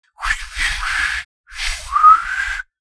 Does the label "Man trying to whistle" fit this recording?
Yes